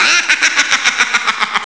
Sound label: laugh; ST-XX Amiga Soundtracker Sample Packs